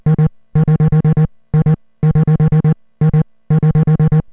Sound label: synth